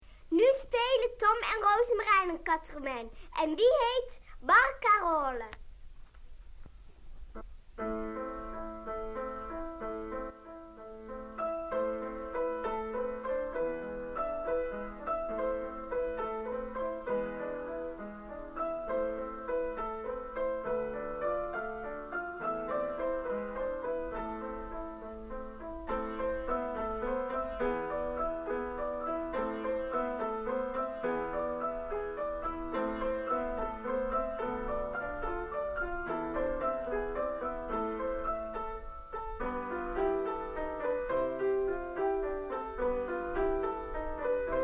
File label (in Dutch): piano; muziek cassettebandje liedjes